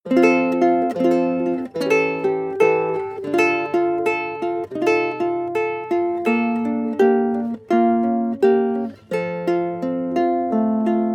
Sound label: guitarlele traditional sequence; loops